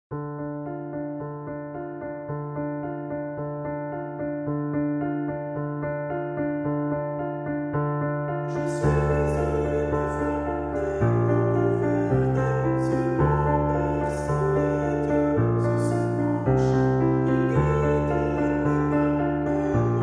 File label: Soprano Mezzo Alto